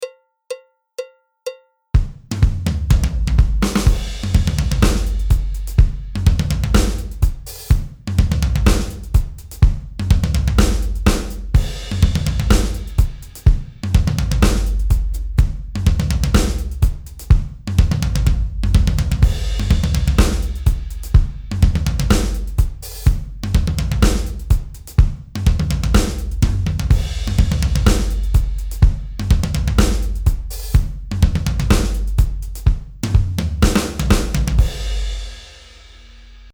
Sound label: tomtom, drums